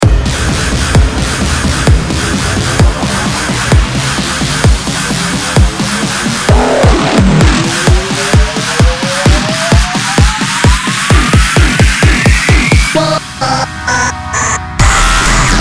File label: dubstep; weeklybeats-2020